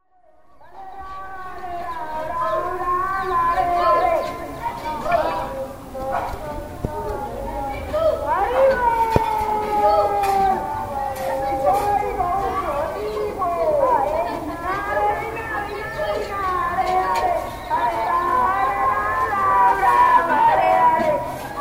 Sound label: radhakund hare krishna children